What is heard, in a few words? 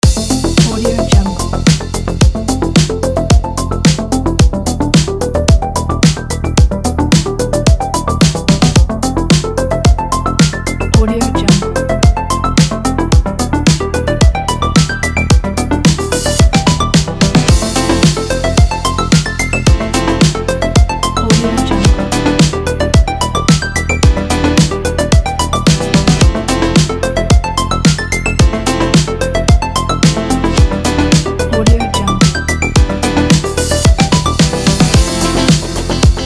modern trends; intelligentmusic